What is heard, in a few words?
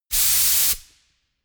pneumatic pump long